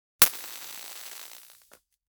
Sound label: a light bulb exploding an; destruction